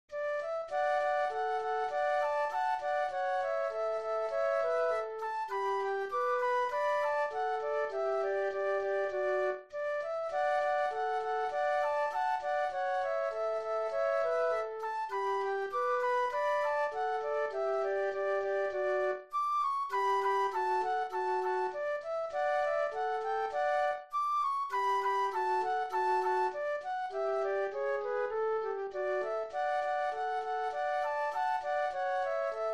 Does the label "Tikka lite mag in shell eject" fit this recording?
No